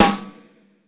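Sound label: GATE snare; Crypton; DrumBase5000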